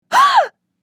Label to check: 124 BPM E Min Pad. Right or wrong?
Wrong